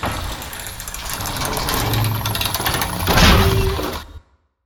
complete; Cosmic Rage; larkan

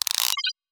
gear left; Cosmic Rage; The Experience-light

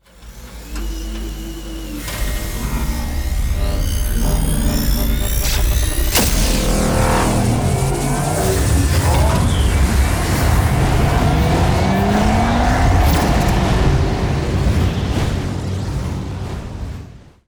jump, ships; Cosmic Rage; The Experience-light

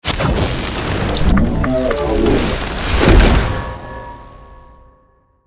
teleport; Cosmic Rage; larkan

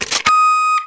med error; Cosmic Rage

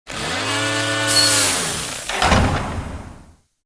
Transfer End, ships; Cosmic Rage; The Experience-light

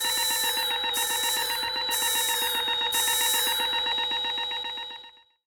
ALARM; Cosmic Rage

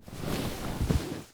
enter, vehicle; Cosmic Rage; The Experience-light

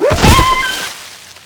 fox die, hunt; Cosmic Rage; The Experience-light

